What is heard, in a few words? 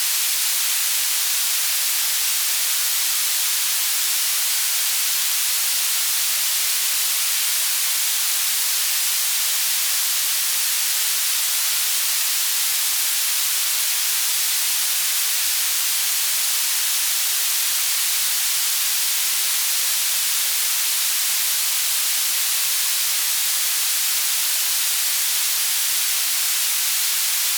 rain; rain level 2